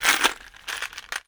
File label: Box of Push Pins; Office Supplies; 1001 Sound Effects